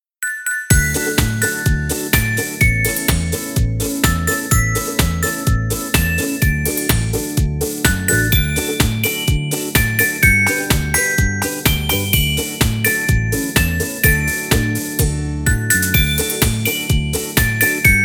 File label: happy birthday to you loop